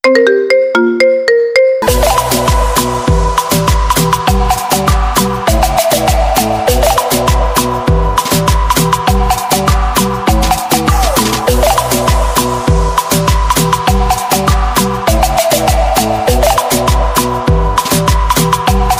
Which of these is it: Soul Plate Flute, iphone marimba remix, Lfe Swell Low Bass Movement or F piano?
iphone marimba remix